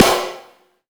snare open

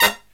HIGH HIT, Brass Riffs; USB Soundscan vol.29 - Killer Brass Riffs; AKAI; HIGHHITS

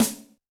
Dry room, Yamaha DTXpress-II snare

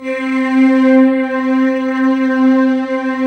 SYN MORP, Roland

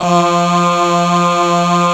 choir F; Best Service ProSamples vol.55 - Retro Sampler; AKAI

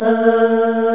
OOO D#3; East West; Global Sounds

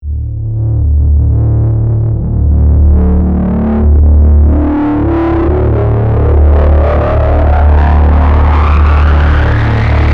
FUZZ; ILIO; Trance Fusion; STOP LONG; FUZZ BABY

